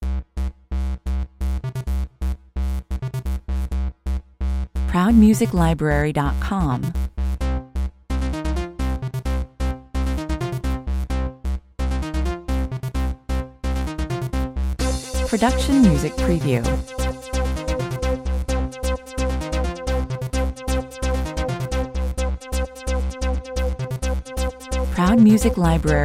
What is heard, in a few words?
four detectives 130 bpm